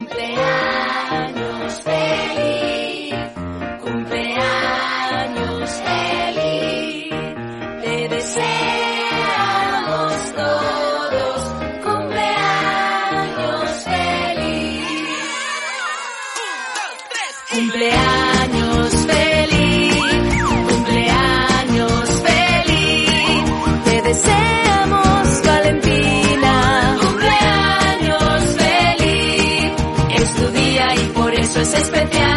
Happy birthday song in spanish

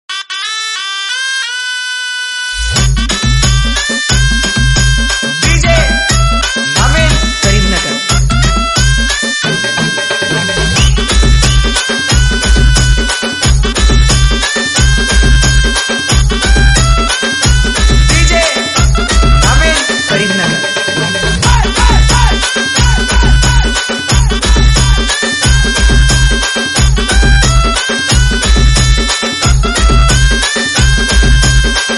Happy Birthday DJ Remix

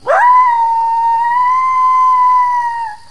Dog Howling Sound

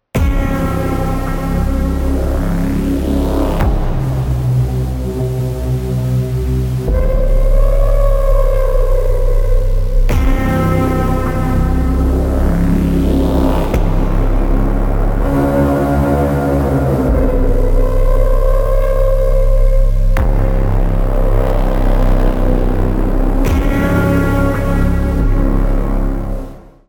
Scary horror escape room

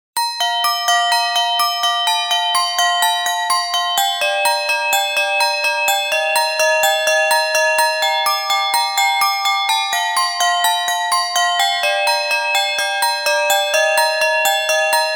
Sound of breeze

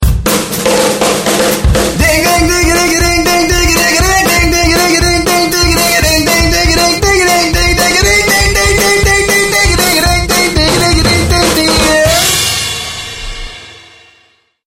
drums drums, steel drums music

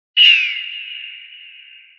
sound of eagle

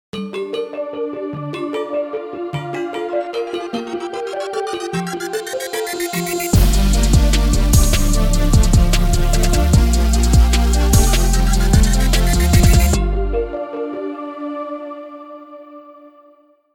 Suspense thriller movie Music

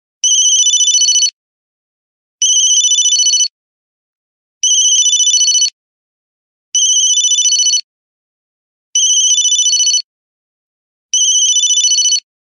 Old ericsson flip phone sound

